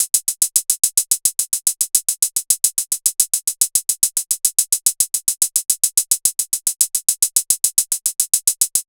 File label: Drum Loop 108 BPM Hihat, Experimental; Drum Loops; Loop Stems; Cleansed